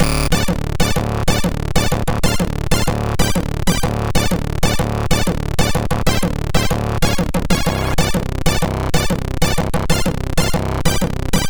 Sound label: Flatline, MOS6581R4